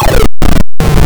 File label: door open; Keen 2